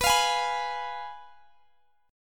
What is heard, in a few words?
Ab Minor 6th B